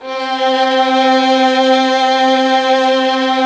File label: TRON 3 VIOS C2; E-MU Formula 4000 Series Vol. 5; Protozoa